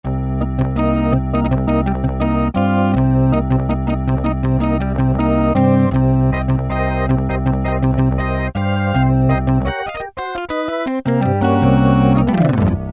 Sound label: funk rock, Technics SX-K450